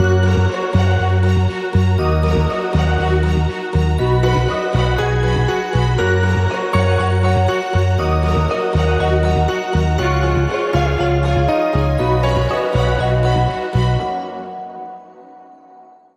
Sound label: Joyful And Inspiring, No Drums